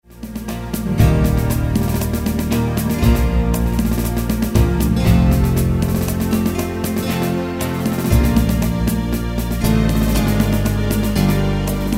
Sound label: Tambor